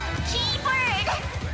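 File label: keyboard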